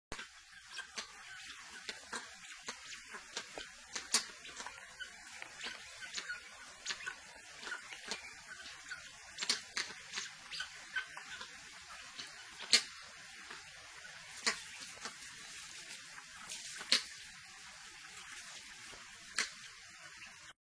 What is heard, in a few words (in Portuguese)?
Canto da galinha da angola; fraca cristata